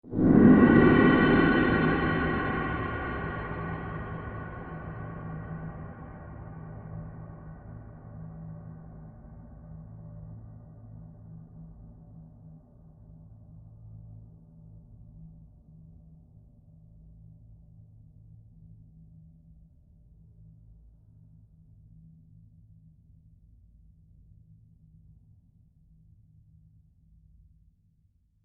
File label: Gong Musical Long Low Rumble; Trailer; Hits; Hits (Sound Effects:Musical)